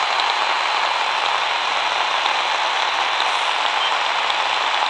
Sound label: noise